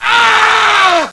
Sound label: scream